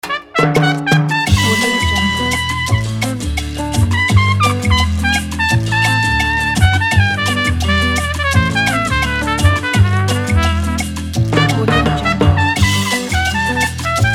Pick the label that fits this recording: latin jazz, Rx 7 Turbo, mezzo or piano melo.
latin jazz